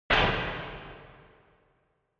Retro Anime Mech Equip

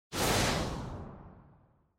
Camera Motion Mid Stereo Pan; シーン・カメラモーション

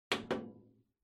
PC Operating Noise Button

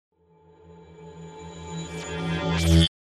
Cyber

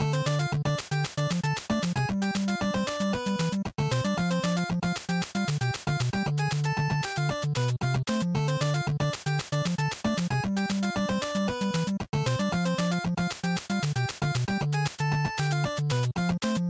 NES Action Stage Select Loop 115

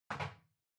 TV Hardware Far RC Put Wood

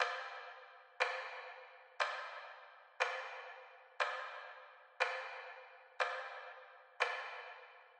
Clock Second Hand (Reverb Loop)

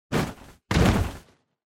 Motion Fall Stumble

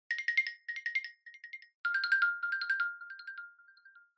Smartphone Alarm Speaker

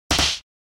Hit Slap, Dry

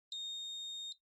Printer Beep

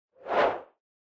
Camera Motion, Mid High; シーン・カメラモーション

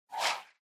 Motion Swish Low Single; モーション スイング; 低 単音

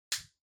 Lighter Far Click

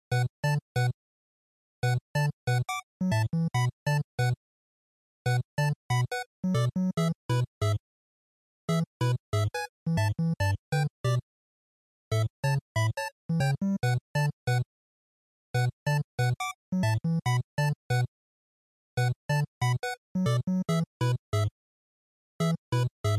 RPG Battle, bgm

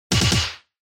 Hit Punch Dry

